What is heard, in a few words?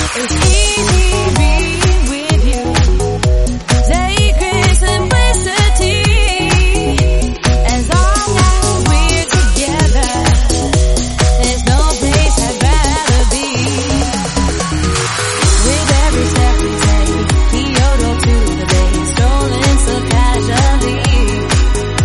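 128bpm A flat minor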